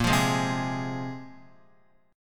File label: Bb 7th Suspended 2nd Bb, Guitar 4E 4A 5D 5G 5C 6F; Bb7sus2; strummed; x,1,3,1,0,3; JGuitar